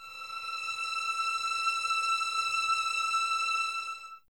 E 6, 11 Violins, Soft; Miroslav Vitous

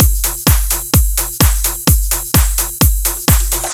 Drum Loop Full, 128BPM

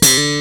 Pop Slide D1; Proteus 2000; Digital Sound Factory Proteus 2000

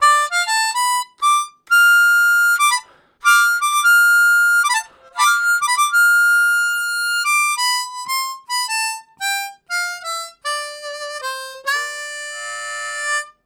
Minor Ramble, Harmonica Riffs; Zero G

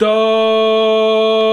VOX, Male Scats; 90s Sample CDs; Club-50 - Foundations Roland

